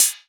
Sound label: METAL Pyrite, perc